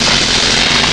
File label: rocketpistol start; zmall; zm boost; privilege; mboss